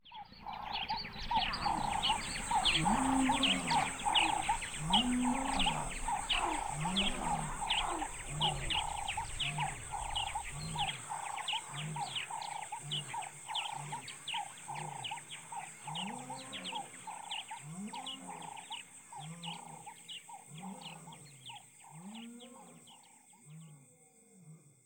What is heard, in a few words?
ambience morning